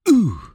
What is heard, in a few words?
character hurt